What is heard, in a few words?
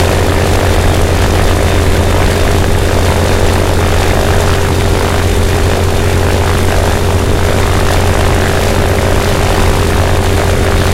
me109 side, engines; War Thunder